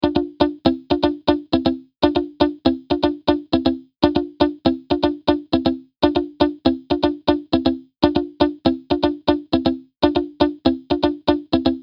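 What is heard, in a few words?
Guitar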